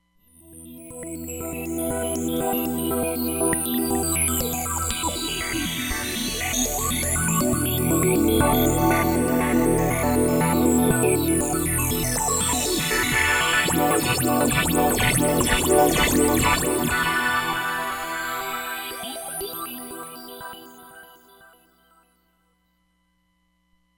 StellarTreck, Roland Fantom XR

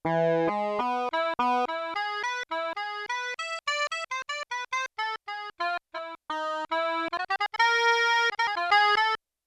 Soprano Sax Chorus, Yamaha TX16W; Alto & Soprano

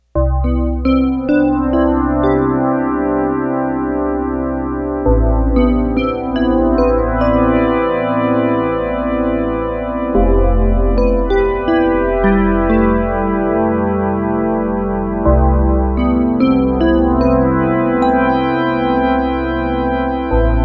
bell el piano voices

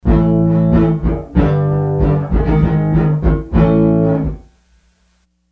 Wood Room; Sony HR-GP5

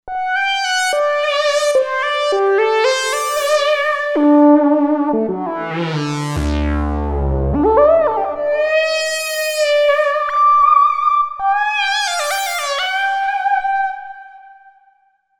Lead Earth Lead

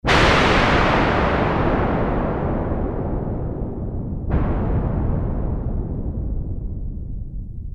Noise Sweep, Roland Juno-106; Factory Preset

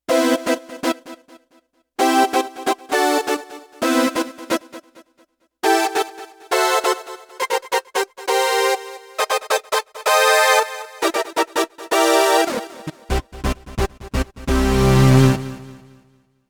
D-50 Syn Brass, Roland VC-1

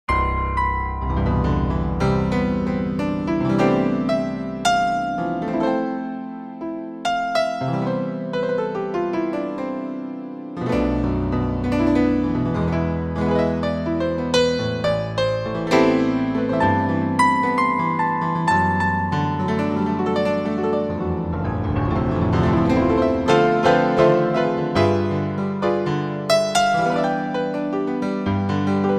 A.Piano 3, Roland JV-880; Factory Patches

